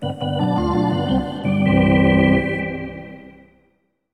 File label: Hockey Organ